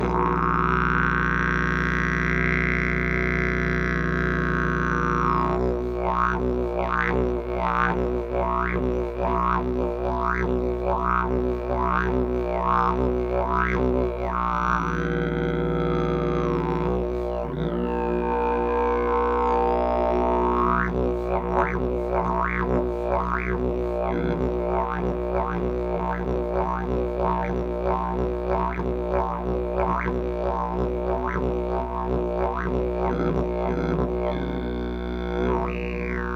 javor s drhadlem, didgeridoo

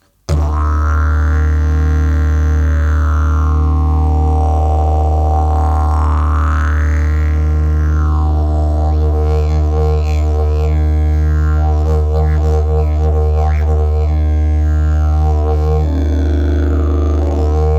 briza, didgeridoo; briza mandala

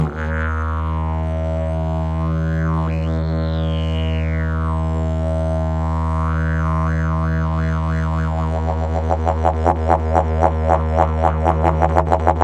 jilm, didgeridoo; jilm bleskomet profi

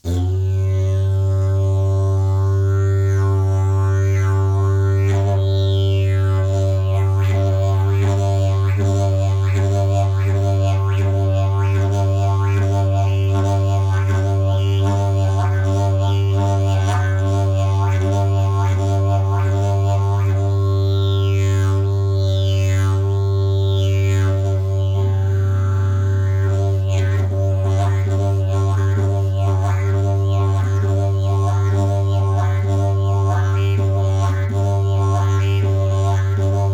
jilm, didgeridoo; jilm cestovka

